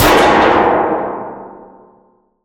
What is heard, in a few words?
heavy metal impact metal plate medium; BlueZone; Heavy Metal Impact Sound Effects